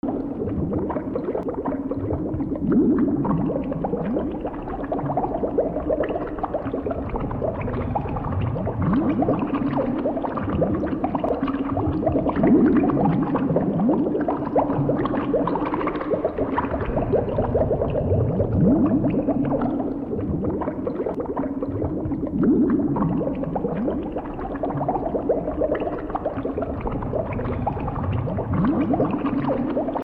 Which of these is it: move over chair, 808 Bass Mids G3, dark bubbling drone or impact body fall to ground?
dark bubbling drone